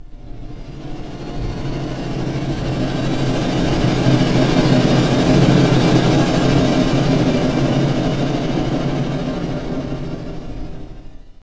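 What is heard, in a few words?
strings cluster; Roland - LA Composer vol. 2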